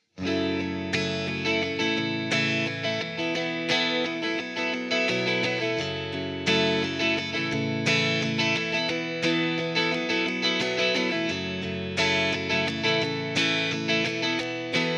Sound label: tele, JCM800; mercuriall